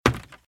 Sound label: Footsteps - Wood - Creaky - Run - Left